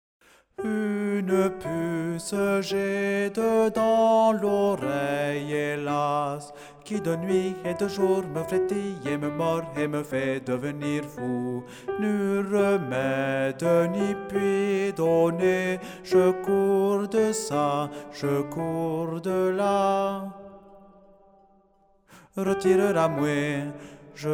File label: ALTO